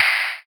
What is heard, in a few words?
Percussive FX; Vengeance Samples; VEH1 Percussion